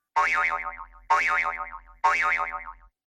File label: iPhone Boing Alarm Ringtone